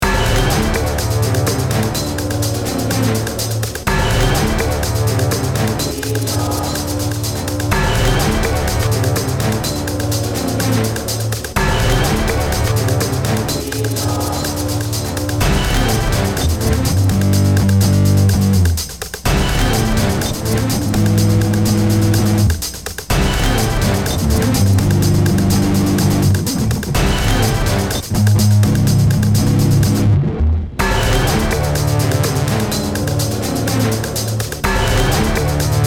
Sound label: Music Loop; battle bears 1